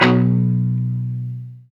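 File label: guitar chunk mute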